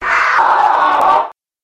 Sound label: monster fallen angel